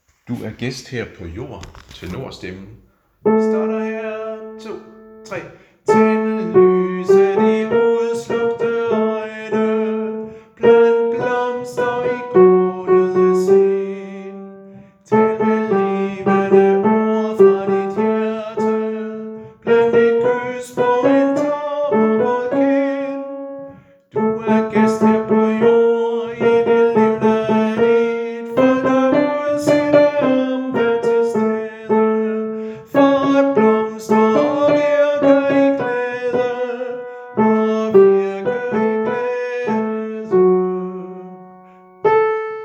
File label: TENOR; harlev koret